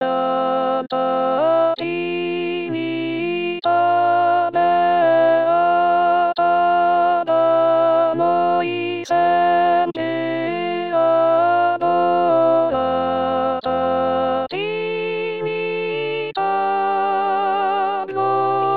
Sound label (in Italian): Alta Trinita Beata Contralti; anno liturgico